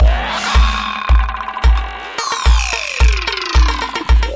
110 bpm psycho spindown electro, drum loops and breaks